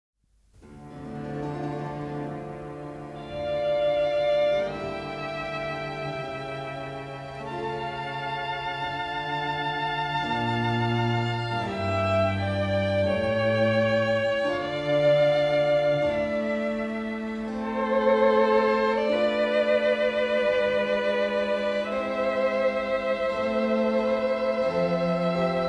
Trio Sonata No9 Golden Sonata; Purcell

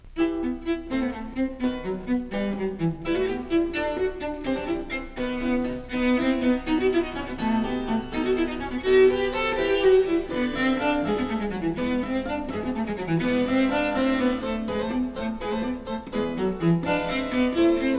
Cello Sonata; Vivaldi; Cello Sonata No5 RV40; Janigro

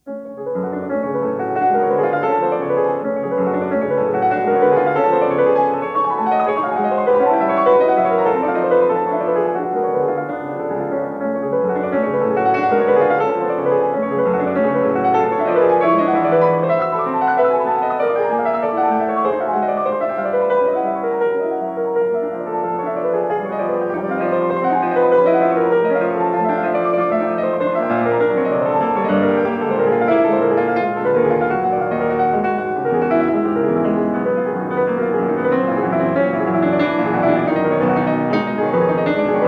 Preludes; Chopin

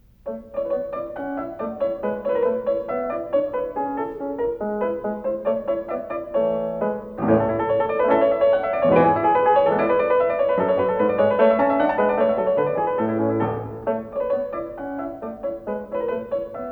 Piano Sonata Alla Turca; Mozart; Piano Sonata No11 K331

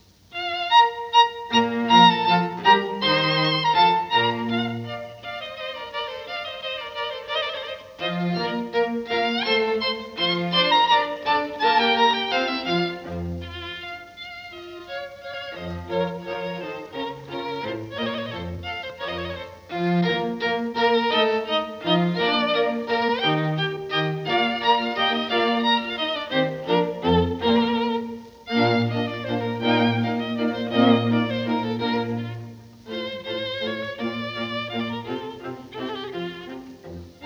String Quartet; Haydn; String Quartet No1 Hob3-1 La chasse; Pro Arte String Quartet

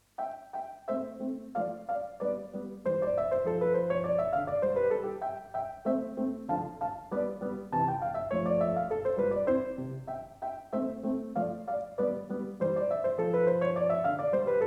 Piano Sonata; Mozart; Piano Sonata No15 K533; Gieseking

